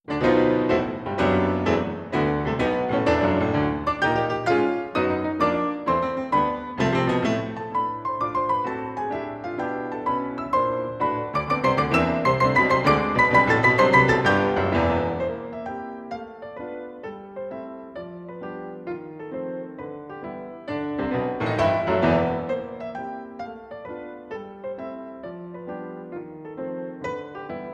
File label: for piano 4 hands, Marche; Erik Satie; La Belle Excentrique (Fantaisie Serieuse); Marche Franco